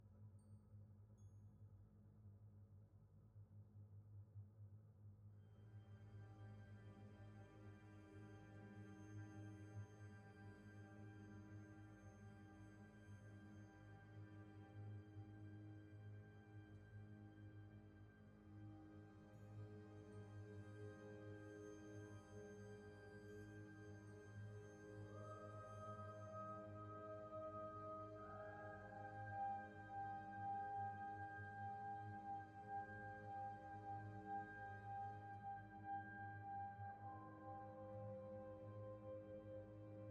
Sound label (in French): Musique ambiance piano